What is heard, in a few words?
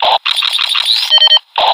status tick expert; ui